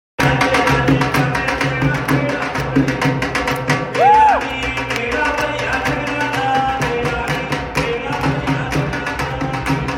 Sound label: dhol